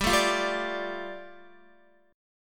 G 7th Suspended 2nd, Guitar; G7sus2 strummed

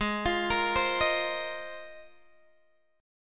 G sharp 6th Add 9th G sharp Guitar Open G

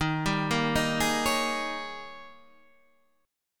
D sharp 11th D sharp

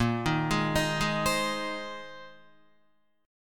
Bb 9th Suspended 4th, Guitar; arpeggiated; JGuitar

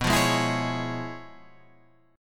C sharp 7th Sharp 9th, B, guitar chord

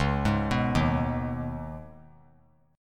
C sharp 7th Suspended 2nd C sharp down; C#7sus2; arpeggiated; JGuitar

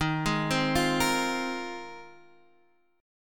F Minor 11th, D sharp

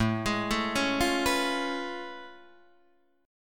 A Augmented Major 9th A down Guitar Open D

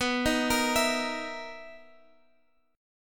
B Major 11th B down Guitar Half Step Down

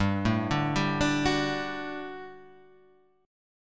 G Major 7th Suspended 2nd, GM7sus2 chord; GM7sus2 arpeggiated; 3,0,0,0,3,2

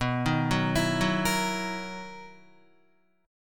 B Major 11th B down Guitar; BM11; arpeggiated; JGuitar

